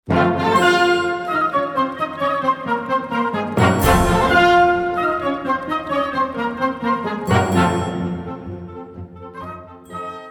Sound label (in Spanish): pasacalle, para banda; AGUA, AZUCARILLOS y AGUARDIENTE